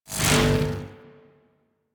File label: Hoverboard Slide Start; Warframe; Vehicles; Venus; anim sounds